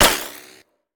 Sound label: Bullet Ricochet; Sound Ideas; Guns